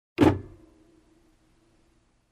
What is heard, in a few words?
Banging Sound single